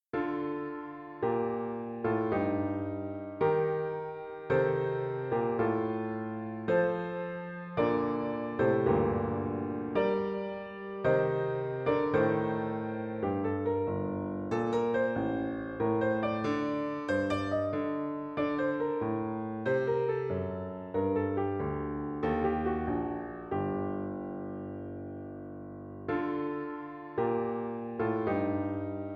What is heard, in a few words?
Soliloquy for Piano